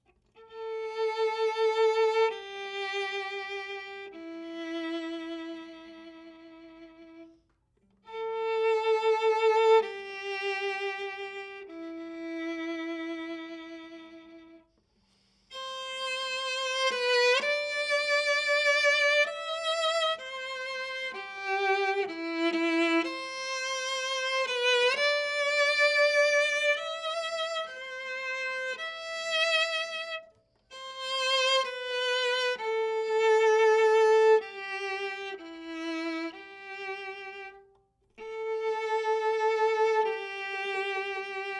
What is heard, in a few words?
Viola